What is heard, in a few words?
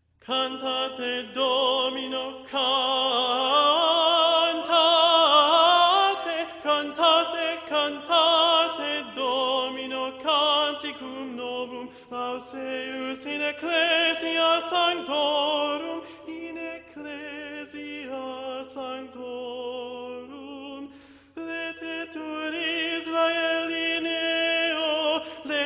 Cantate Domino Tenor